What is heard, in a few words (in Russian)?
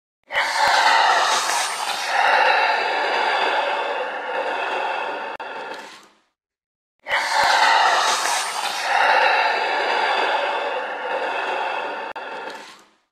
krasnouhaya cherepaha